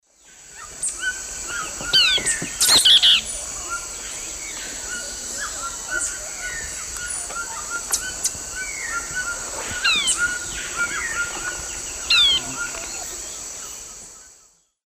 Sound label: Myiozetetes luteiventris, calls; S. America; Eastern Lowlands